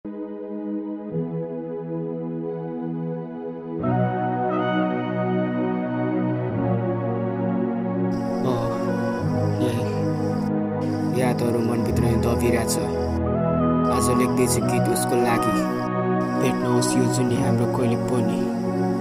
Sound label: sad song beat instrumentals